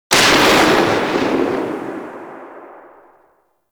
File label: Shotgun Tail; Weapons; Warframe; Tenno; Revenant Shotgun